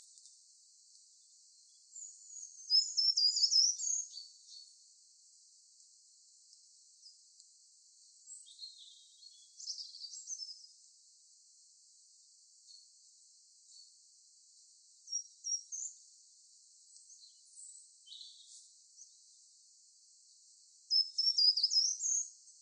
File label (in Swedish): vrango song